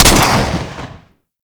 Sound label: Swarm Missile Launcher Fire Shot; Warframe